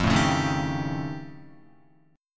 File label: F Augmented 9th F Guitar, chord; strummed